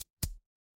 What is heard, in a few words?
ui click